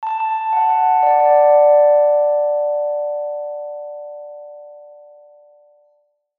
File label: public places annoucement tone reverberant